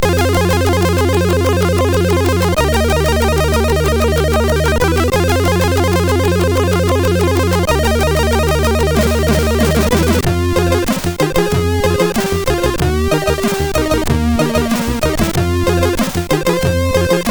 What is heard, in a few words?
Only Cool, 6581; MOS6581R4